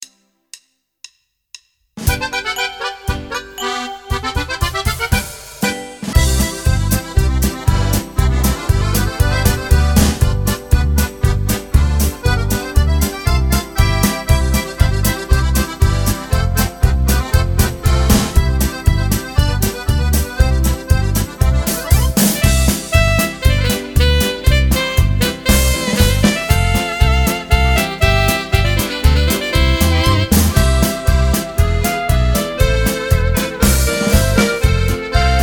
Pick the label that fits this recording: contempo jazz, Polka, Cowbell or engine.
Polka